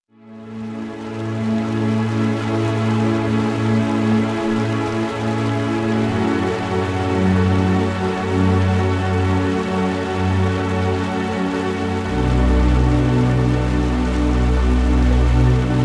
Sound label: rain